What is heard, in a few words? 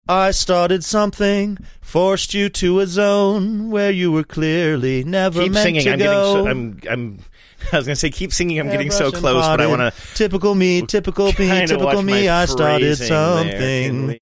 singing portion